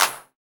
clap, hip; Best Service; Dance Mega Drums

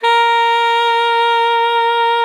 A#4, saxophone; Best Service ProSamples vol.42 - Session Instruments

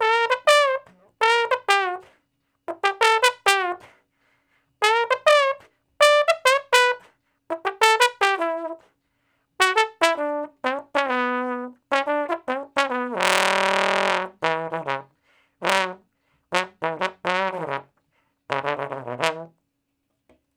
Trombone Straight (E), Horncraft for R&B Solos; Sonic Foundry (Sony Creative Software)

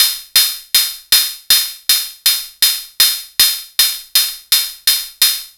closed hat, raw

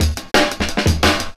175, Breaks; Drum&Bass; EZ Rollers

